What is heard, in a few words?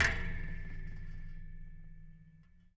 Colide